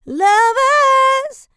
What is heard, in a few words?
licks, vocal, dance; ILIO; Vocal Planet